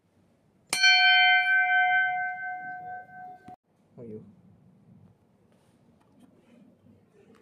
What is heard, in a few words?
bell